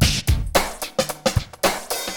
HELP, LOOPS; Best Service; Hyper Dance

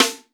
FUSION KIT; Northstar - New Gold VOL-1

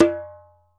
ASHIKO, African Perc; E-MU Producer Series Vol. 7 – Old World Instruments

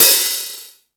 Ambient Rock, E-MU Pro-Cussion

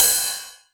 909 Ride; Novation Drumstation

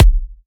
Electro Kicks

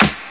SD, dance drums hip

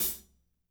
HAT, WARM+FAT KIT; Spectrasonics - Burning Grooves CD2

